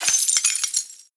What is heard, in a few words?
debris glass, bullet impact debris